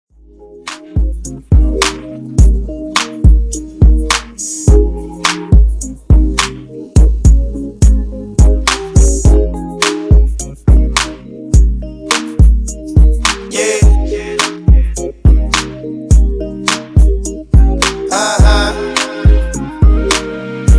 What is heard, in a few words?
Mash up 105 Bpm